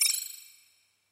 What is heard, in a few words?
reels start; Magic Stars 5; Wazdan